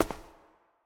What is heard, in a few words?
cave grounds step; Golden Crayon Sounds